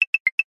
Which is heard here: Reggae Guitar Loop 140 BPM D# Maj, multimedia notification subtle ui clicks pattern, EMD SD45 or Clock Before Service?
multimedia notification subtle ui clicks pattern